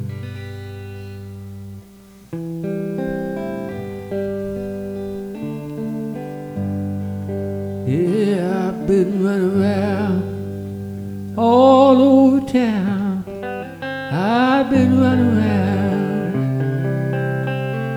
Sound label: loose folk